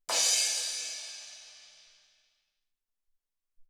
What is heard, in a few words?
Crash PP, Alesis SR-18